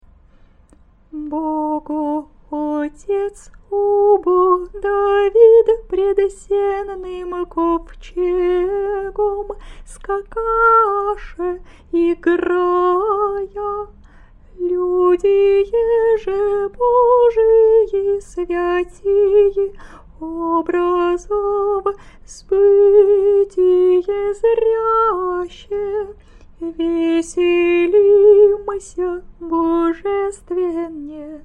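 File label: easter canon byzantine slower, singing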